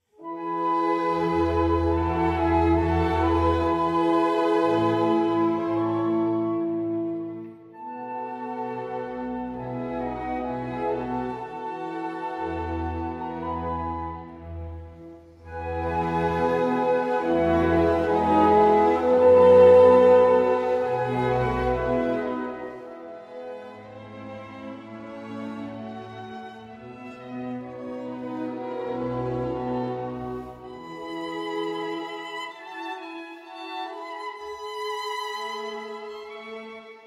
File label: Adagio for Violin and Orchestra In E Major